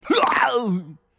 Brute Hit; Character Brute Hit; Angry Birds Epic; android ios mobile gamerip

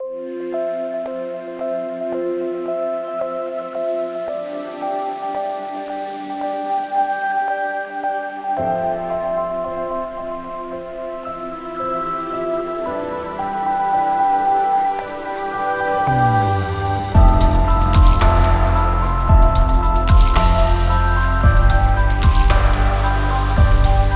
Lobby BGM; Neoverse

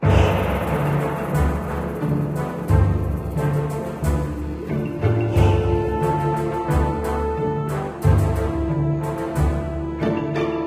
Pirate; Diner Dash Flo Through Time

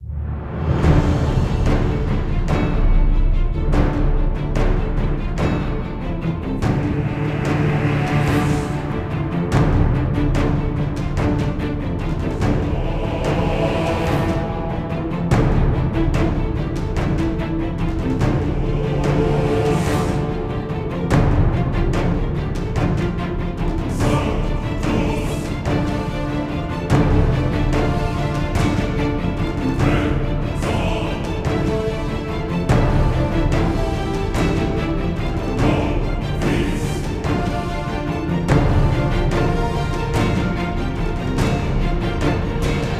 Pvp

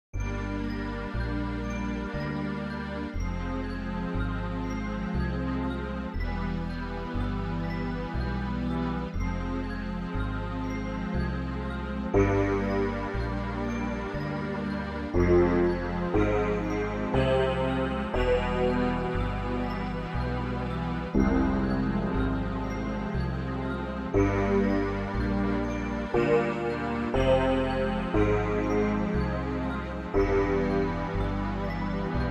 MIDI Dungeon

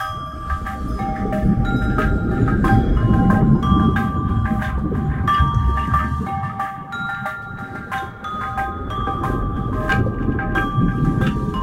Watertank; Fishing Strike; Android iOS gamerip 2018

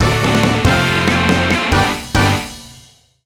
Touchdown; NFL Rush Zone; Leapster Explorer

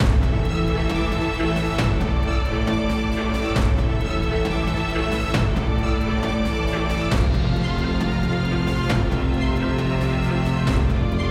Sting Landing Neutral

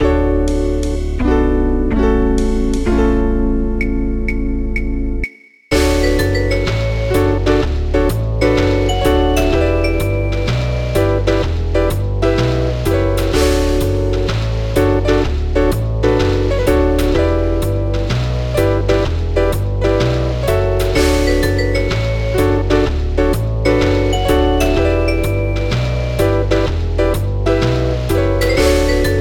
Chorale (Instrumental); FNF Weekly

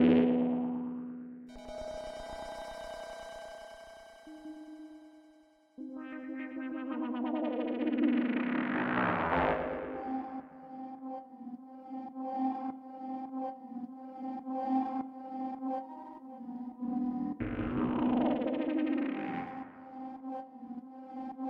Fight Background; We Happy Few

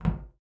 Metal Footstep; Blastmorph Pinball; xbox series xs

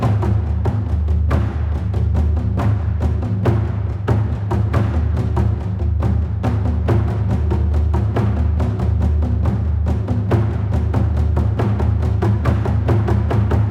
battle loop

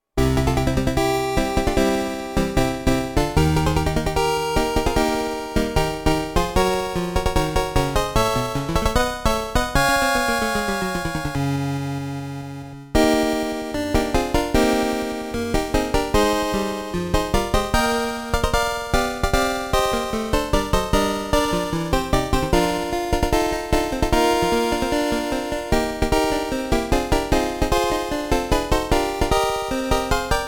Achievement BGM; 1000 Ten Thousand Point Achievement BGM

